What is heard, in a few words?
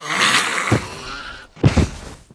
snake dead